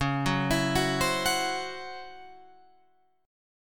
C sharp Minor Major 7th Double Flat 5th, Guitar Open F; arpeggiated; 13,13,16,13,15,13